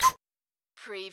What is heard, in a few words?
chu chu